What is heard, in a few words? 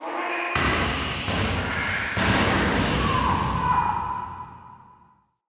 Animal Interior Destruction; Encounters; Ode Pollution Tower